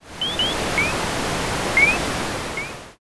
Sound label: forest